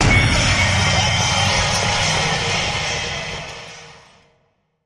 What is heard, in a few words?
Comet Impact